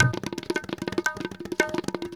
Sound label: India, Heart of Asia